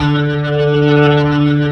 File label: D, The Piano; InVision Interactive